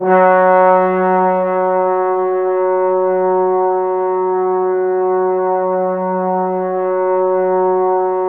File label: trombones F#4; Q Up Arts; Denny Jaeger Private Collection